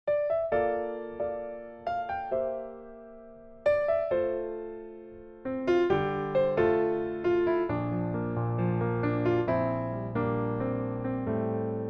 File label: F piano